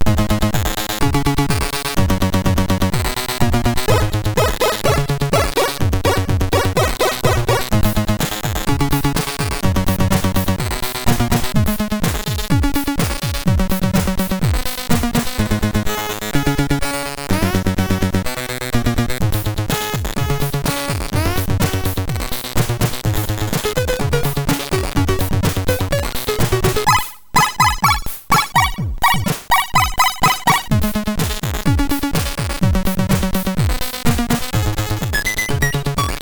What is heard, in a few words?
Magazine intro tune, MOS6581; MOS6581R3; hvsc